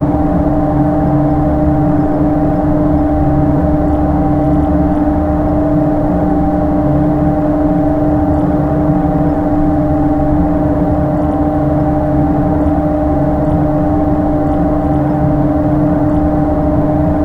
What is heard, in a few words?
submarine hull making sounds; breaking